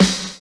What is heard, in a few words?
SLOWPITCH